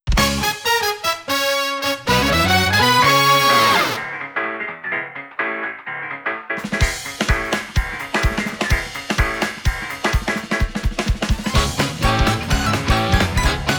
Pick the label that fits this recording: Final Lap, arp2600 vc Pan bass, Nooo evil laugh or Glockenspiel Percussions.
Final Lap